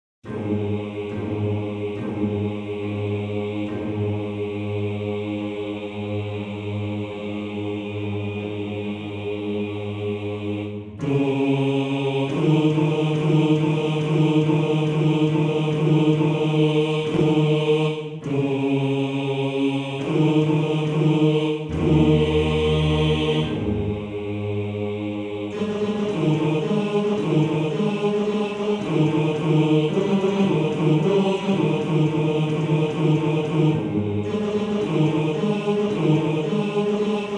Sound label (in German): Bass; Bass 2